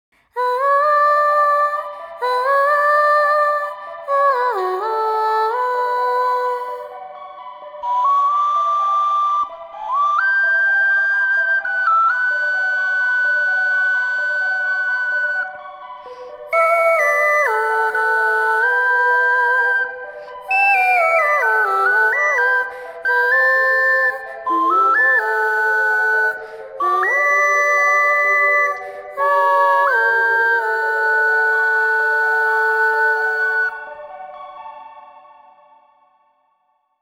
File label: Ocarina Practice Version; legend of zelda the link's awakening